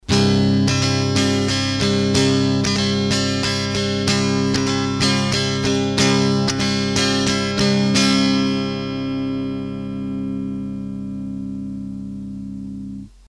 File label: Gtr Amp; Antilope Zen Tour